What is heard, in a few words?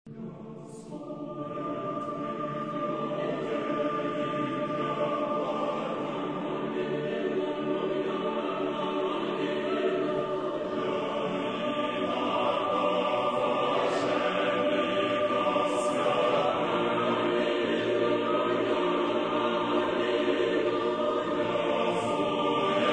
Schnittke Choir